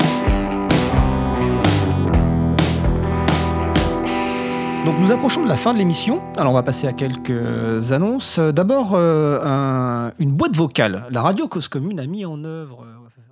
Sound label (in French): simulation jingle annonces